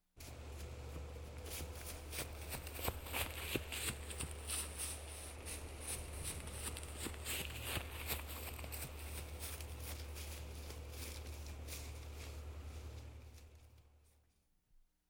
Звук шагов Бег по опавшей листве

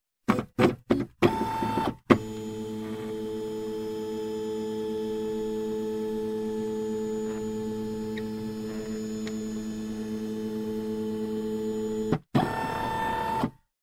Звук сканирование документов

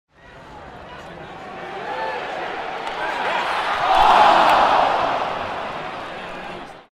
Звук болельщиков расстраиваются из за промаха в ворота

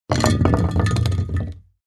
Звук аэрозольный баллон пнули ногой

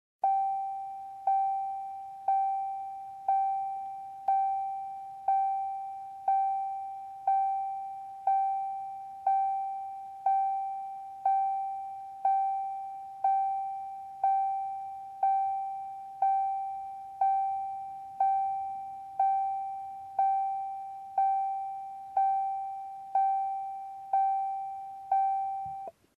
Звук сигнала открытой двери автомобиля

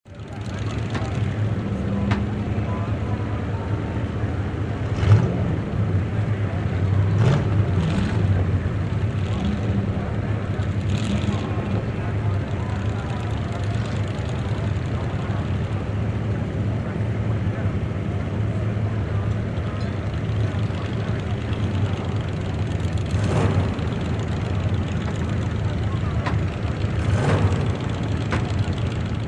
Перегазовка спортивные автомобили на старте sports cars at the start

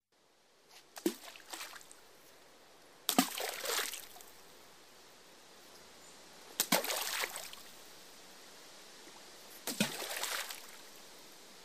Звук броска камня в воду

